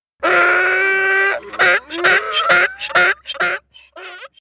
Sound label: Vocalizations; Ep4; Tusken Raiders Sand People